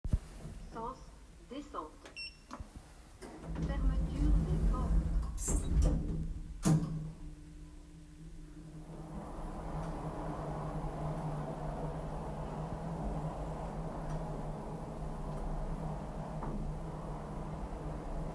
Ascenseur; deambuler

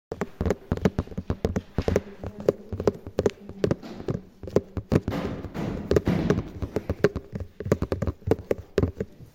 tapoti boite

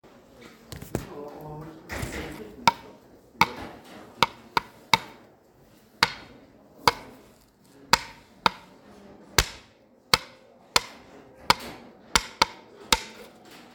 bois

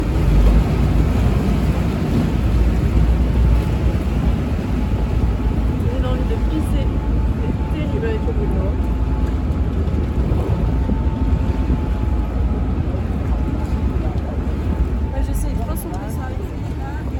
son Venise

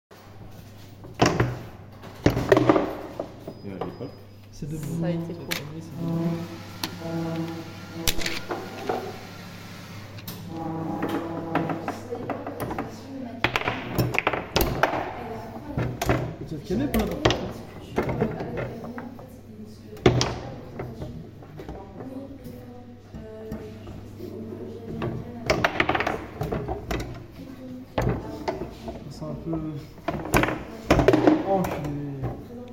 babifoot

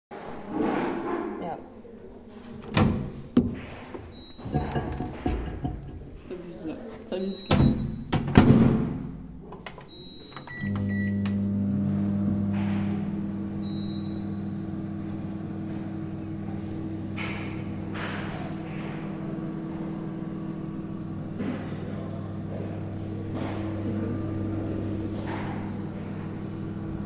microonde

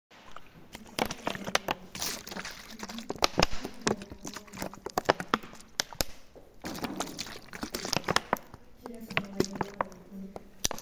eaux

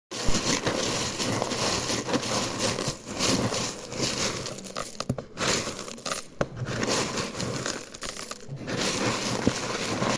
tournesol

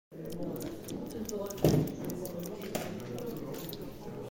bouche

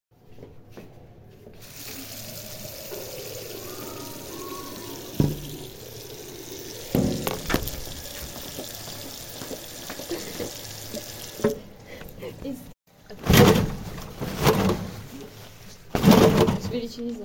Robinet

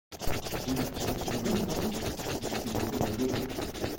gratte

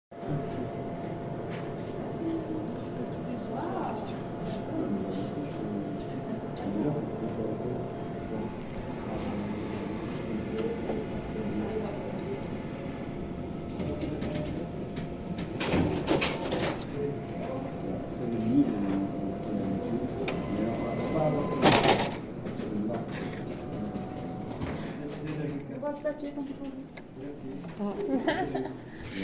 imprimente

